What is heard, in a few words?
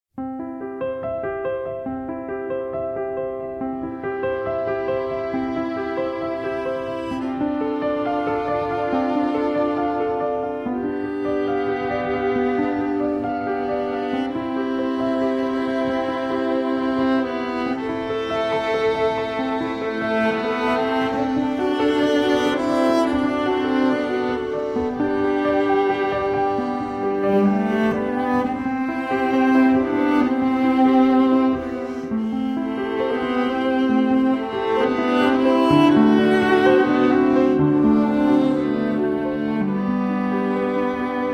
Piano Trio; Gounod Ave Maria; After Bach's Prelude, BWV 846